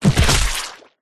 impacts body hit baseball bat hard whack crack squelchy bloody